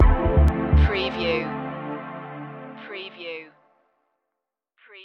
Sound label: stinger